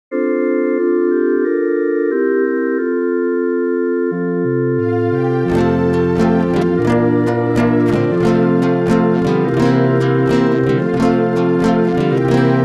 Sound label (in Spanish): Preparad los caminos Guitarra